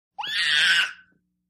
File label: Baby Girl Voc Shriek, nineteen month old